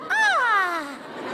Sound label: argh